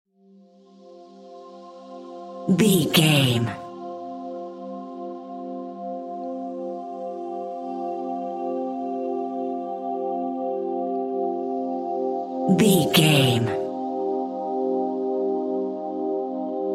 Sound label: dark ambient pad